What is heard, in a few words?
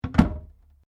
Door Pivot Interior Laminate Cabinet Close; Various doors